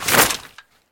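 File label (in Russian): returning ammunition to the armoury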